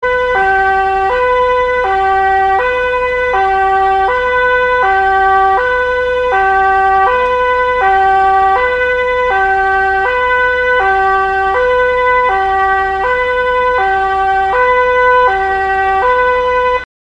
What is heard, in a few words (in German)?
martinshorn thw stehend